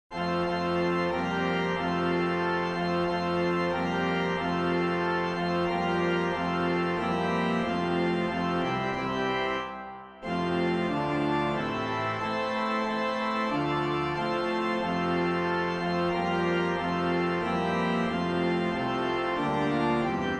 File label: Organ